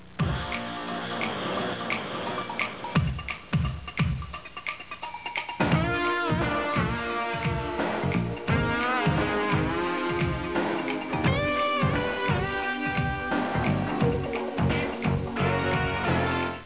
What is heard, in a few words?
Snappy Music; Discovery Toolkit Samples; Careers Toolkit